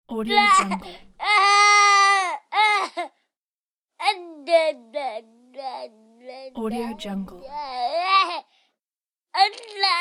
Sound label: baby is crying